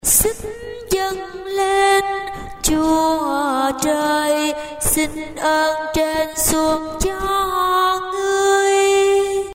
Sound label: alto